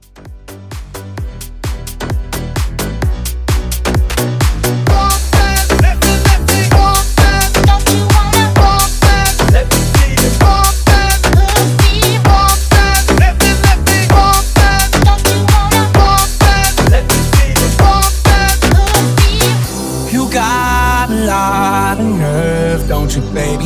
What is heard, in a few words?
Hybrid Mashup